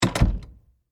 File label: Door Close Hard Wood, Foley, Door, Close, Hard, Wood, Closet, Door; Miscellaneous Door; Blast Wave